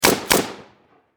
Machine Gun 7.62 Caliber Bren Lmg Double Shot Distant Perspective; Warfare